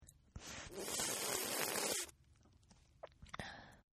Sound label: Slurp Female, Drinking